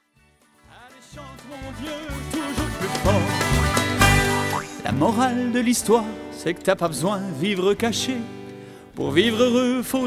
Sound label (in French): allez chante